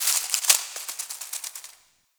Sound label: Zariman Foliage Rustle; Ambience; Warframe; The New War